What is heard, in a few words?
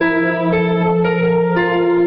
piano and keys, sad loops